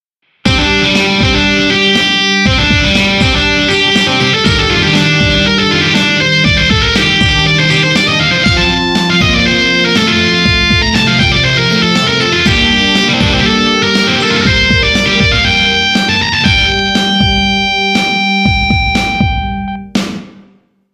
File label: amp guitar